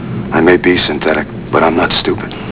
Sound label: synthetic